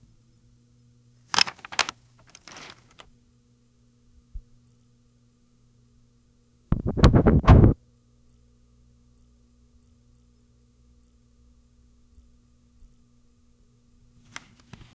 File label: the sound of inserting a, retro